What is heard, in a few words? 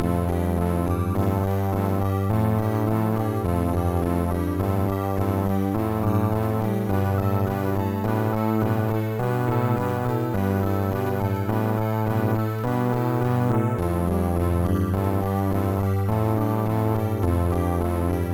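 background eerie; Sonix Music Driver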